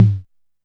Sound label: Tom Hi, Alesis DM5